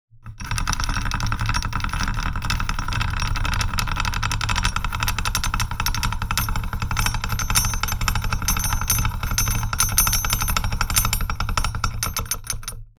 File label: Bench grinder rattling; Industrial Machinery; gfxsounds